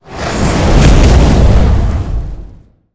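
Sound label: ghost house death, monster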